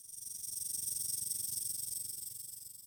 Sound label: snake